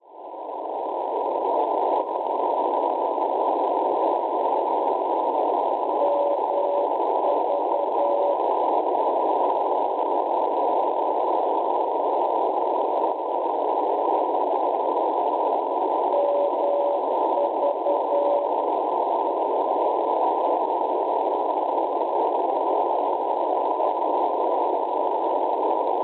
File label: NDB Melun Francia 434 kHz; MV; Schwarzsee